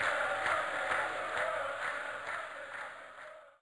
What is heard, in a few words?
crowd transition